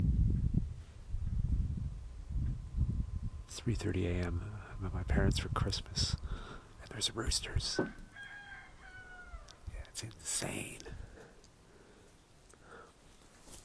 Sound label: roosters